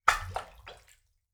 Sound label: Liquid Water Movement Splash Bathtub